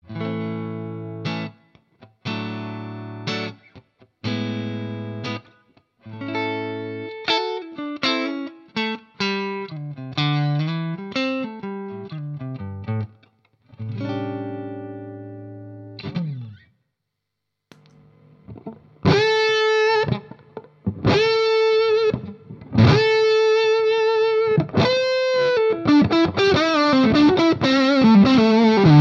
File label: strat neck more gain; fulltone ocd